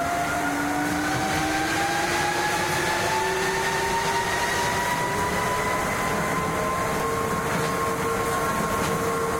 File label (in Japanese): monokoza middle top; tetsuon